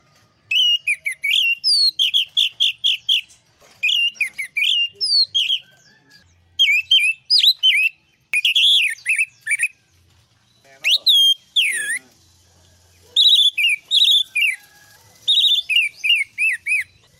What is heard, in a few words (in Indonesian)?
suara masteran anis merah